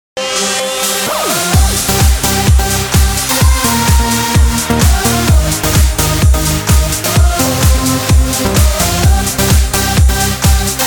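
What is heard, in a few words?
jingle; Otbivka na KVN komandy 6 korochek; отбивки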